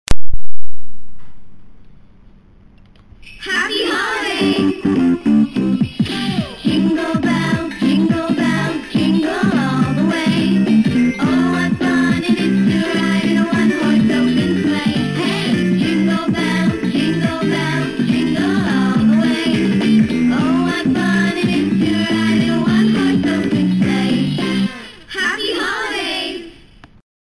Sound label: Jingle Bells, singender weihnachtsballon